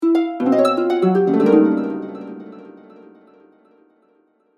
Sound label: Musical Harp, Production, Element, Musical, Harp, Accent, Mysterious, Chaotic; Musical Sound Design; BlastWave